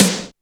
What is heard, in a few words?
SNR ROM; Back In Time Records - Big Bang